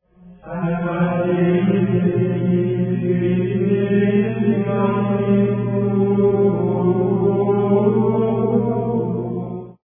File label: old music